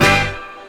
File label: HORNHIT; E-Lab; X Static Goldmine 2; akai